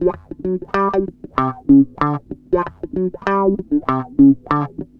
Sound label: WAH, WAH WAH GTR; USB Soundscan vol.31; Miro s Grooves; AKAI